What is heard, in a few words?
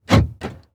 Truck GM Envoy Lock Door Interior; transportation